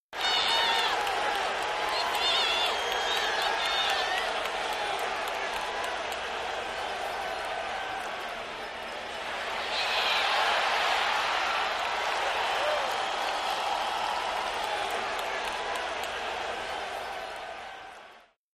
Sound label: Football Crowd Chee, sports arena football